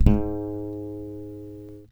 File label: DRY BASS, Electric Basses